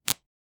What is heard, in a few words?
short sound effect, tabletop